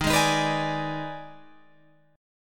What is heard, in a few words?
Eb Major 7th Suspended 4th Sharp 5th Eb, Guitar, Open F; EbM7sus4#5; strummed